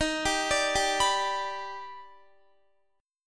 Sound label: Eb Augmented Major 7th Eb down Guitar; Eb+M7 arpeggiated; JGuitar